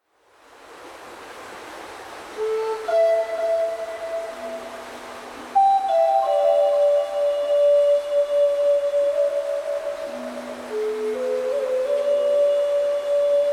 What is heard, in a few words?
Flute Music in Bamboo Forest